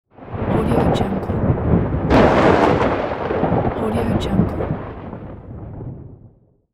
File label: thunder; quattro-boom